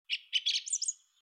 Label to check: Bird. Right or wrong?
Right